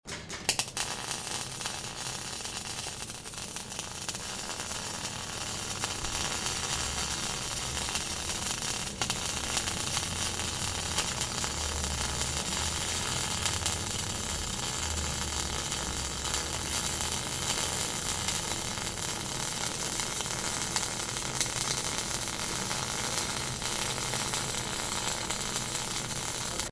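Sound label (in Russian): сварка подальше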